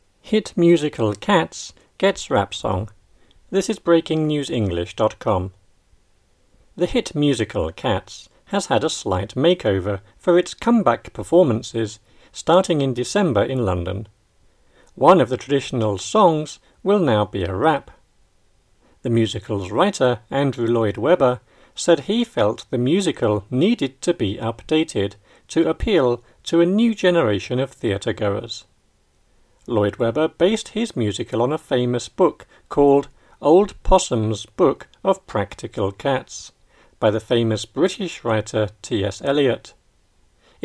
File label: rap song